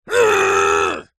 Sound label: human male scream strain lift; zapsplat